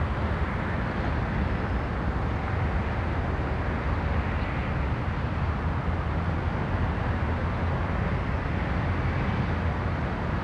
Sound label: town; Mafie 1